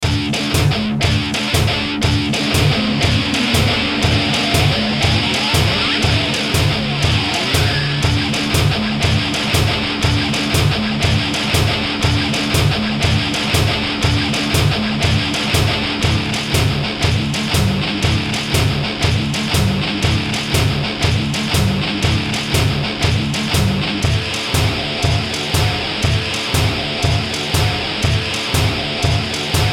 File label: Beat Guitars Industrial Driving Hard Edge; Miscellaneous Loops